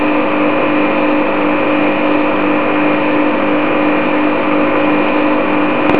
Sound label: fesem edaxpump